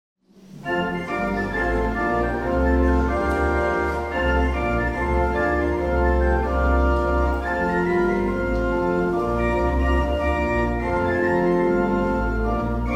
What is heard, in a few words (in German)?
Zugabe Jazz, Orgel; Orgelschaetze; Nordwohlde